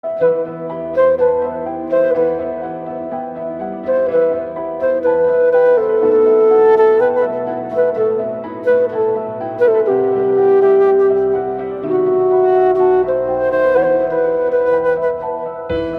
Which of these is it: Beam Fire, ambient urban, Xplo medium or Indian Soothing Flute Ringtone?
Indian Soothing Flute Ringtone